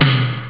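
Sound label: SIMMONS TOM; jazz jackrabbit 1; M8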